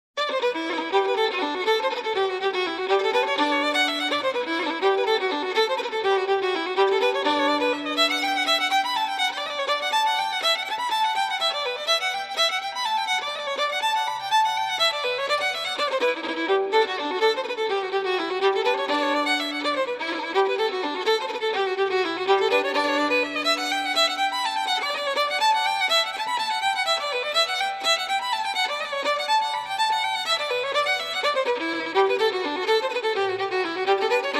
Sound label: Reels Fiddle Only; Trad (PD)